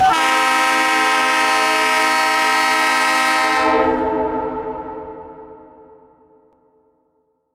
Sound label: EMD SD70 horn